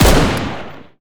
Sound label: fire; Urban-Coalition; Decay; arccw ud